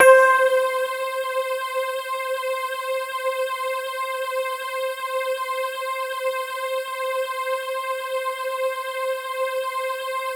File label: C5, Synth Line